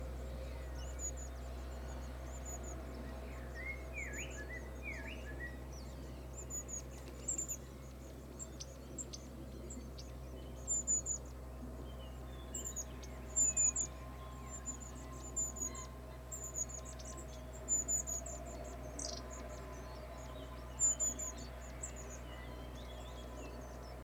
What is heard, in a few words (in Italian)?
versi canto Codibugnolo aegithalos caudatus long-tailed tit mito; Vocalizzazioni varie; bioacustica